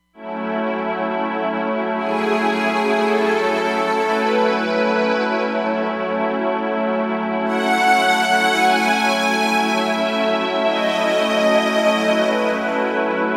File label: Flap Strings, Roland Fantom XR